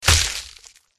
dragon warrior attack contact

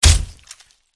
cristal golem attack contact up

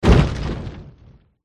golem attack contact bottom

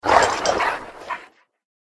dog upg attack voice mid

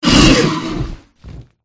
dragonkin wound

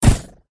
jelly fish attack contact up

